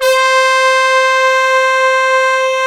MOOG; USB Soundscan vol.49; Analog Fantasies; AKAI

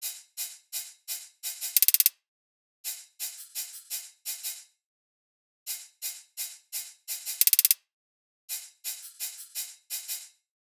loop hat 85; Seismic Loops

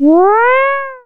C#, Synclav FM, FX; Big Fish Audio

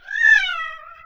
CAT, Anime Sound FX; COMIC

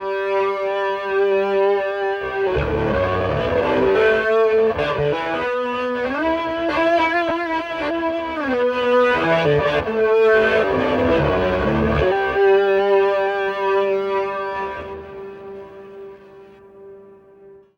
Scrum Long, Electric Guitar; Sonic Foundry (Sony Creative Software); Friendly Fire; Wrath

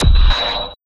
Zappy Fx; Sonic Foundry (Sony Creative Software); Esoterik Beatz

